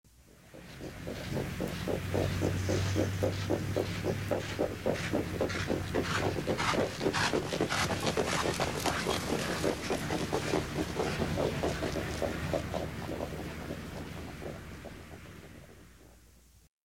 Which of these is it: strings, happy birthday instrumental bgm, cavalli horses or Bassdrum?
cavalli horses